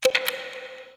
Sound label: countdown